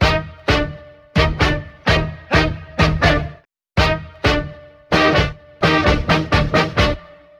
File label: horns mexican, phonky loops